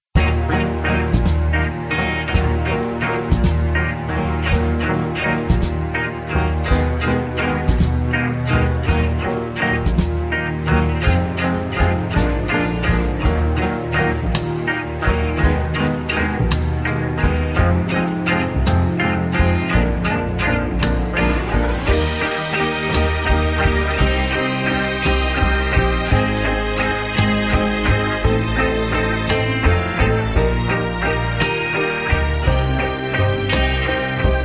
Eb No Backing Vocals, backing tracks

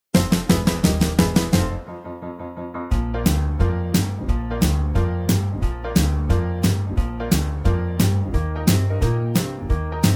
Medley Down 2 Semitones No Backing Vocals

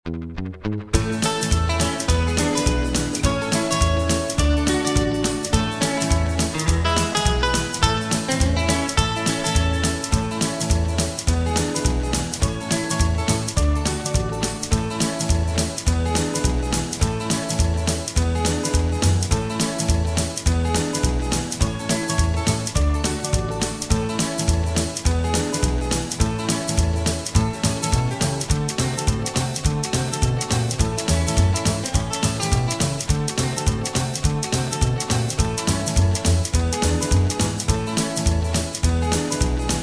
A No Backing Vocals, backing tracks

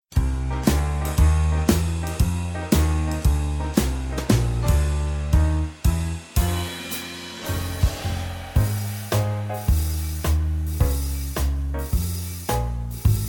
(Ab) No Backing Vocals

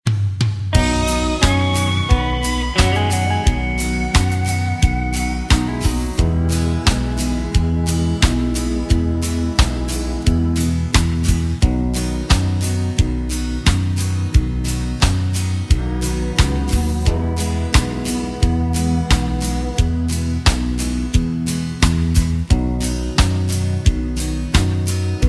No Backing Vocals, (B)

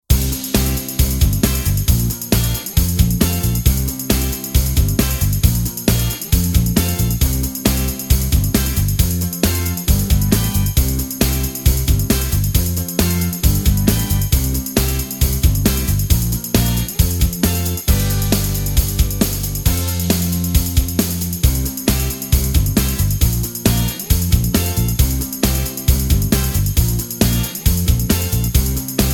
C No Lead Guitar, backing tracks

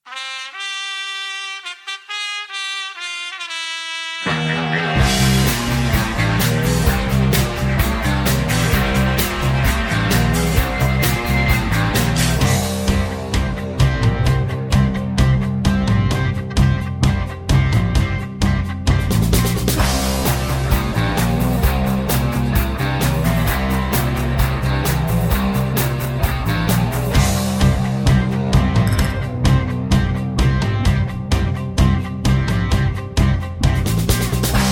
(C) No Backing Vocals

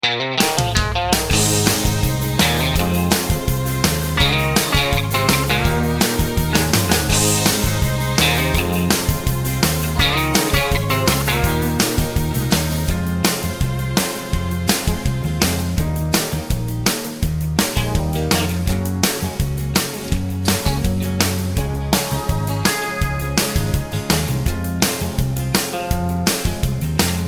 (Ab) No Backing Vocals

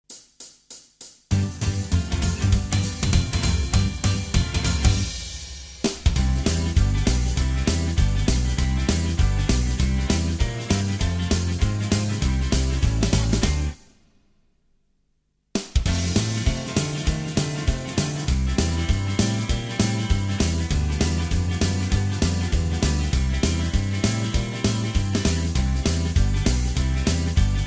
(C) No Lead Guitar

